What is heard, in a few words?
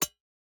environment pickaxe hit iron